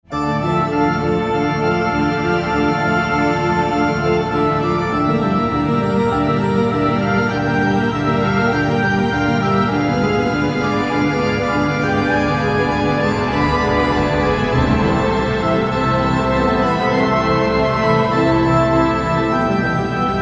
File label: Organ; Waycrest Manor